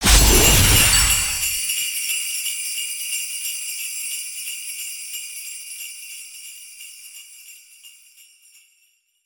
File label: Vehicles Holiday Boost; aronwk collection; ndaudio